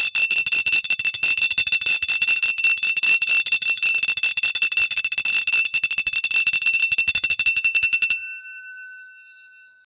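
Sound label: an ancient cracked iron bell, discordant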